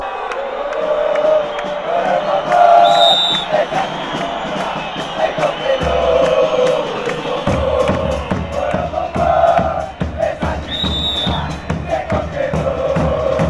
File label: no one is singing around here, chants